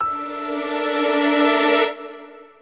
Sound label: Reward Court